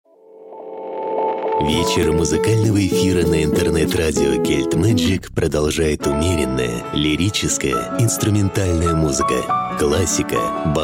JINGLES FOR RADIO

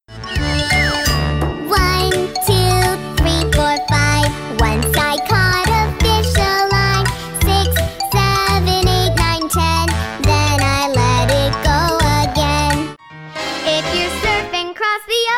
Singing Samples

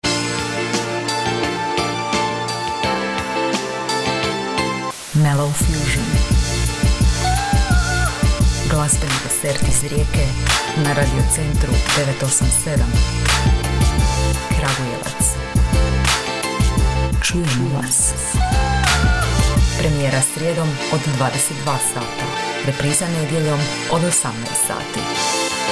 mellow fusion jingle croatian

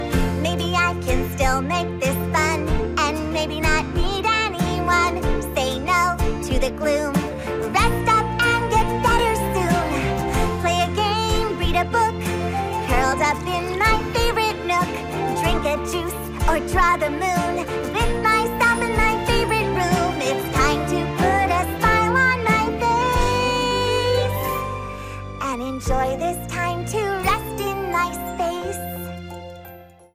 Singing

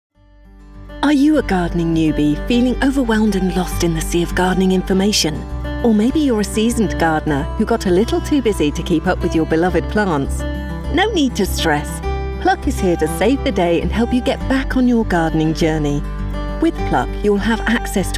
Gardening Music Bed

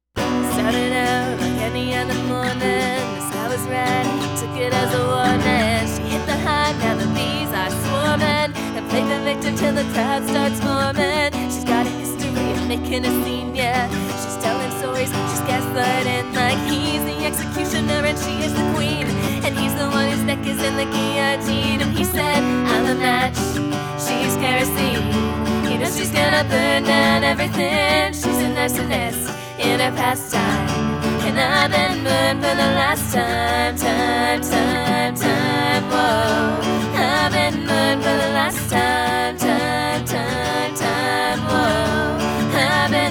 Singing, female voice

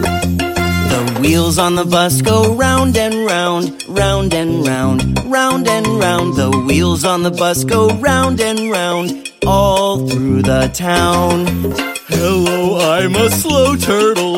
Kids Song

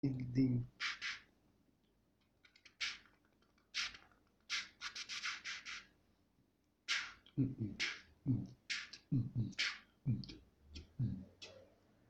Beat Box

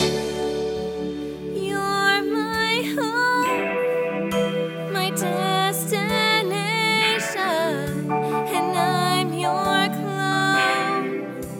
Singing, female voice

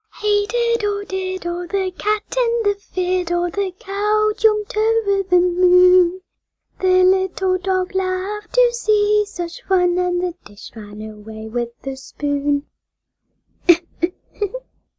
Child Song

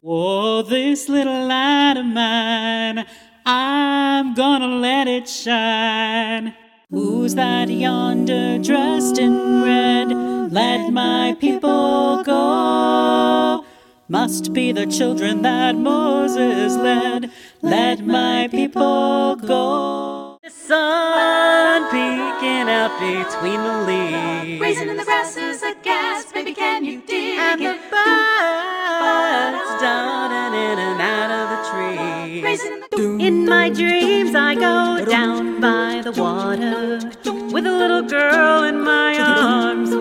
Singing

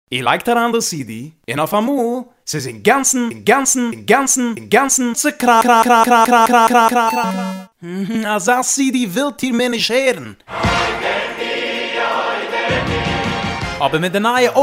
Scratched CD

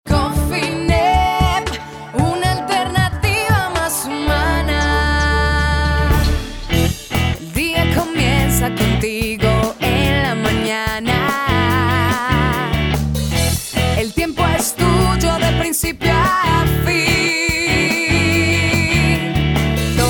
Jingles

